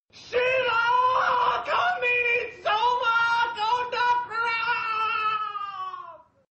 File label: shiva blast ringtone; Navratri Special